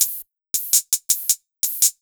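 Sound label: 110 hi hat loop, Ragga & Dub Reggae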